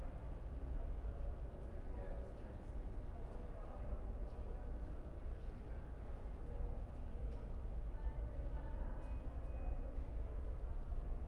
ambience metro station platform electrical buzz train arriving and departing toronto canada; sonniss gdc